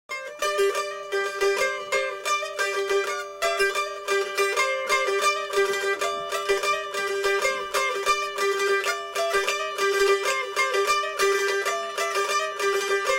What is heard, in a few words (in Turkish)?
iki telli